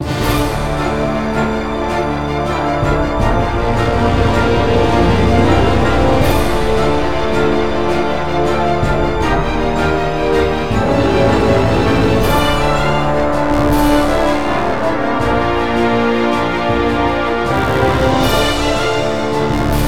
ORCHESTR; Transformers Armada

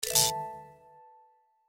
Sparkling Bomb Awake; My Cute Commissar

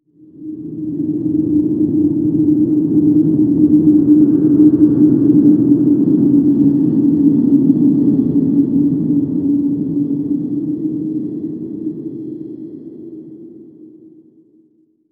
environment wind stinger

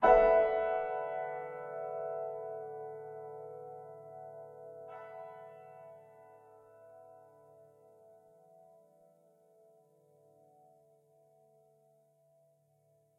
Piano

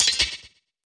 down shakers

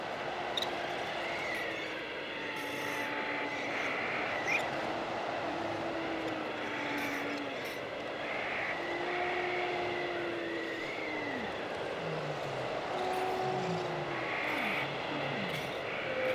Canadian Wilderness Ambient; X2 Wolverine's Revenge; Nintendo GameCube

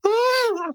inmate pain; Devour

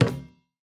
Basketball Pass; Doodle Jump 2; android

